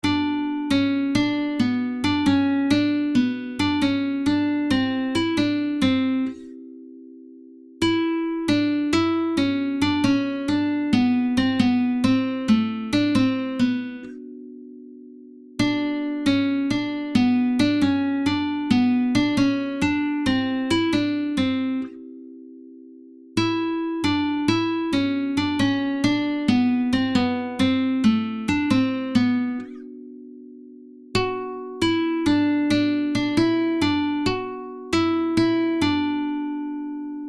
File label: guitar